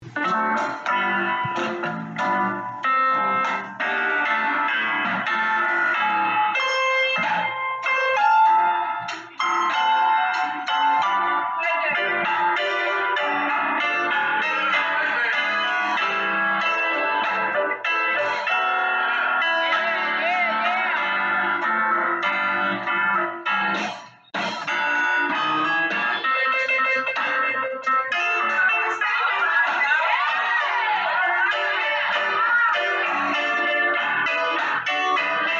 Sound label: Piano Intro